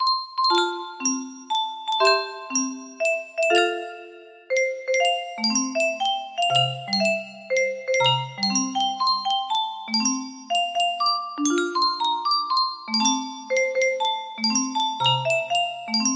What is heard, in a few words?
stenka razine, music box; music box maniacs